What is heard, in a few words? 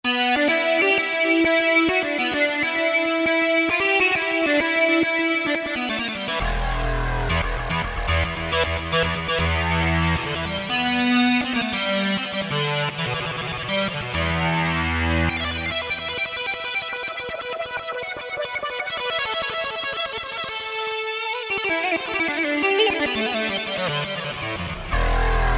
Unison, MIDI Combos, Korg DSS-1